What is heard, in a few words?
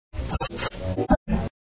gas leak; LOONEY